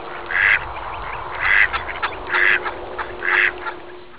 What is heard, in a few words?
mallard, birds